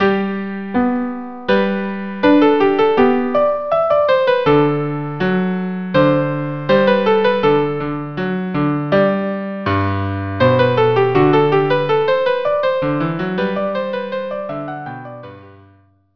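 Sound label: violin cello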